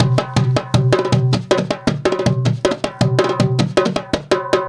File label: junku groove; Best Service; World Colours